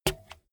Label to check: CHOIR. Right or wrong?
Wrong